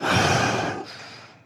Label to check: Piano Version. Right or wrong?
Wrong